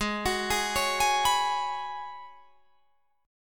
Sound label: G sharp Minor 11th G sharp Guitar Drop D, chord